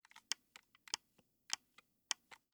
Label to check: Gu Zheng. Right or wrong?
Wrong